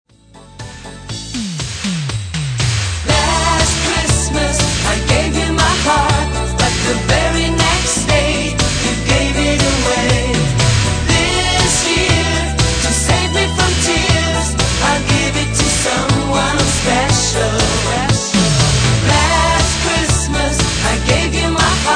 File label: Pop Christmas Medley